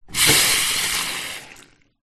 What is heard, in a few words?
household tap small faucet bathroom on off quickly